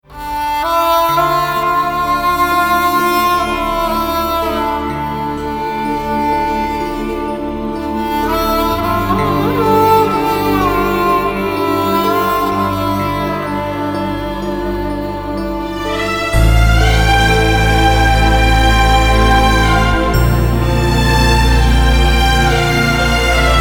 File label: Instrumental